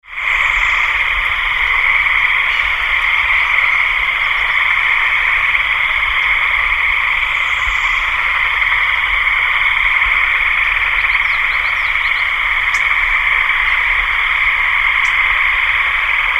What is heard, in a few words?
frogs